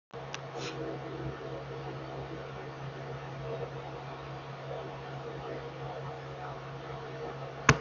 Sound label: slight muffle high, 3dprinter fan noise